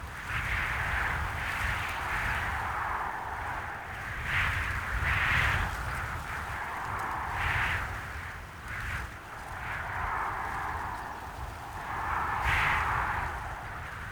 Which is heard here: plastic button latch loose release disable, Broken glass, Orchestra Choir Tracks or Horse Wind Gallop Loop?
Horse Wind Gallop Loop